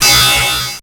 Multimedia Internet CD-Rom Flash Wipe Electronic

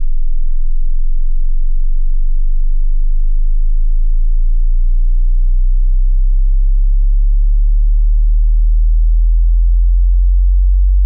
sine sweep log

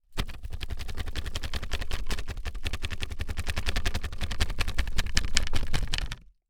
Impact Wood Shake Earthquake Ground Shake; Impacts

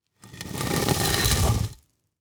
Impact Brick Drag Through Rock Salt; Impacts

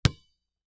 Sports Basketball Single Bounce On Cement

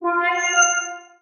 Transition Musical Tone Warble; Production Element

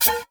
Multimedia Internet CD-Rom Flash Hi-Tech

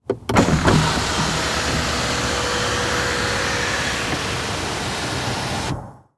Truck GM Envoy Open Sunroof; transportation

